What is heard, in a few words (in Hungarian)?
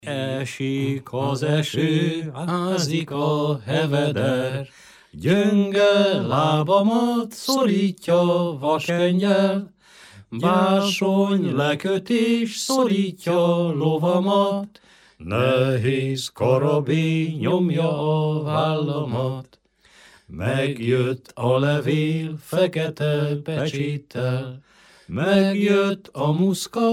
nepdal; esik a eső